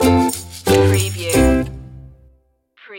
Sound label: plucky life stinger